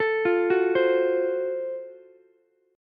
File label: Gb Minor Major 7th Flat 5th, Ukulele; arpeggiated; 14,17,14,15; JGuitar